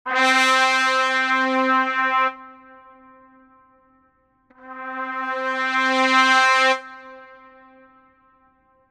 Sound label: env trumpet